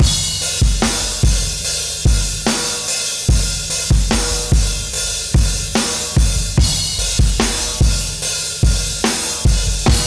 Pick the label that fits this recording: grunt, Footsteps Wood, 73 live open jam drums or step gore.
73 live open jam drums